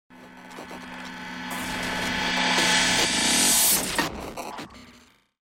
Sonido Glitch terror; sonidos de terror

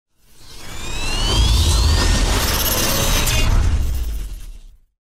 Sonido transicion tecnologica; sonidos tecnologia futuristas

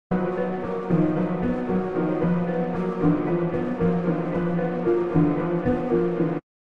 sonido terror piano

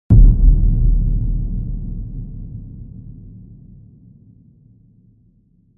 Sonido golpe terror; sonidos de terror

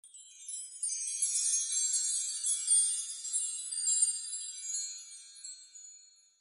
sonido polvo estrellas, sonidos magicos

